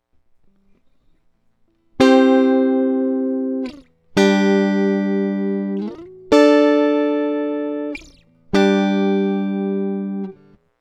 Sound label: e. gtr